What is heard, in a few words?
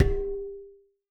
war ui source drop corner jagged sheet metal on ground snares; resonance